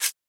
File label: Dagger Pulled From, knives